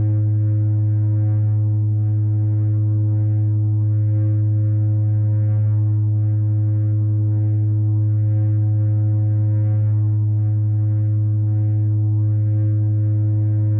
No Input Feedback loop medium low soft slightly fuzzy steady slightly pulsating; Alessio Mellina; No Input Feedbacks